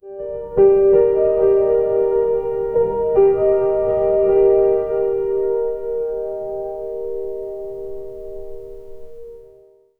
resonant piano mellow ton

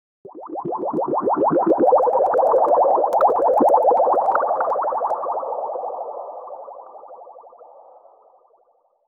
a bubbling health potion

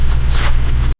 a card brushing lightly a

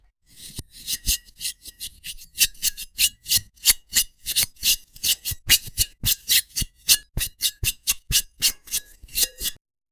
scratch skin rub; sound effect

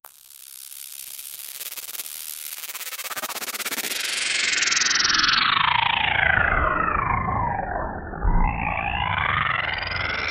flying luminous particles, accelerating

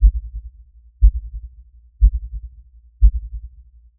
deep rhythmic, rhythm

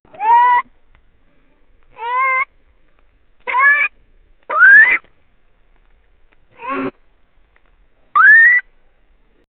ai beep

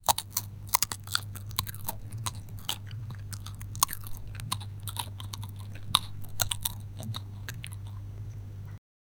a rat chewing on a, apple

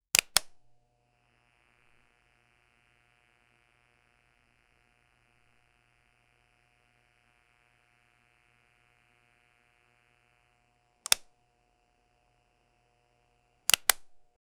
slow soft press of a, button press

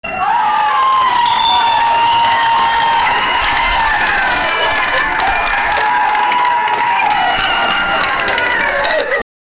create ambient club noise

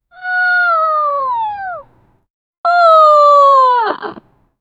women falling on ground

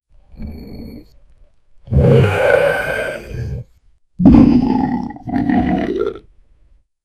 alien burst out of belly

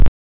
quick jump sound; bunny

